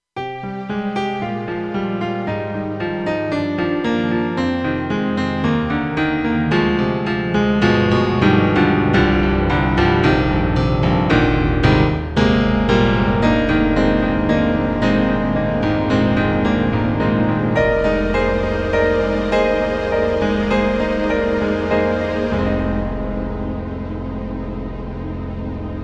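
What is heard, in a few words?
Korg X3R Piano and Strings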